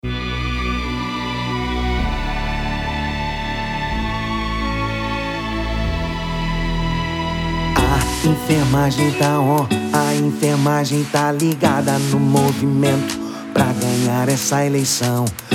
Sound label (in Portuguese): jingle eletro; voto enfermagem